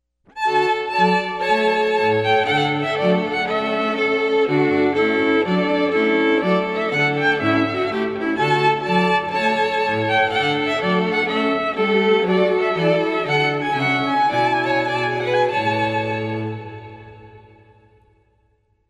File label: Trumpet Tune